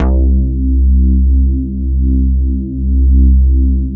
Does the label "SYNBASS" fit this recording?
Yes